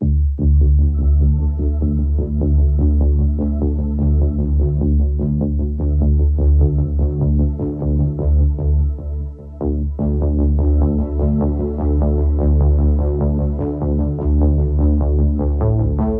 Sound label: Drums